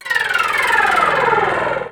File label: FX Scratching; Vengeance Samples